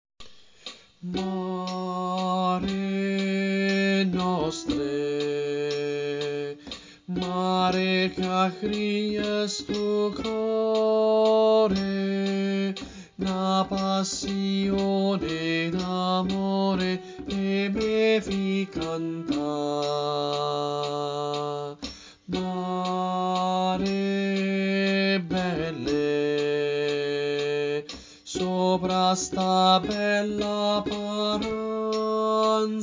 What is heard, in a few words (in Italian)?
Contralti, Coro; Coro Isola del Giglio; Mare nostre